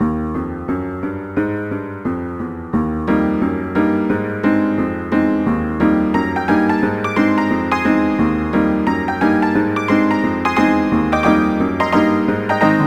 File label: Dance Jive 2 Fast; knigge spielend zum guten benehmen; nds